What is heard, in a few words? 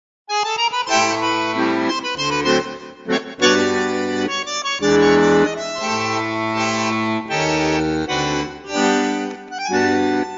tango